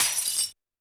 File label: percussion, Roland TD-20